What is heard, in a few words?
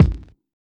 Hiphop kick; Reverb+EQ+Comp+Limit